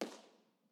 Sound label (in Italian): IR palloncino, Teatro Benevento; B-format